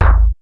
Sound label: DIST KIK; BEAT2000